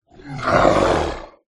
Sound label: horror evil demonic growl